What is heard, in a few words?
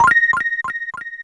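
level up; garrysmod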